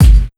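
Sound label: ROCK 132 snare drum, Big Beat Drumloops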